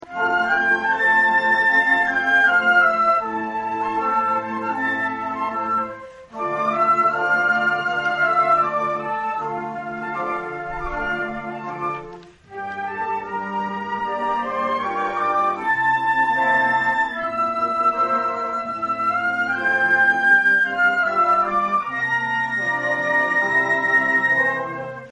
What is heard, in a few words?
concert, flute; AUTUMN concert 2016